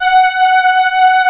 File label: MATRIX 12, PADS; Masterbits; Vintage Voltage